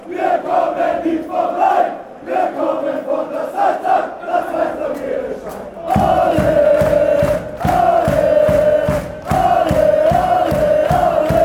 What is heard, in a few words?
fanchants; super salzburg ale